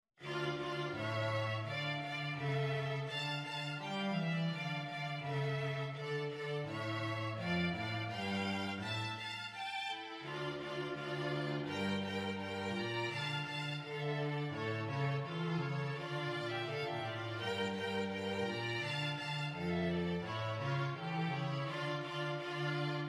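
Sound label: Sevivon vln vln vc, violin violin cello; Jewish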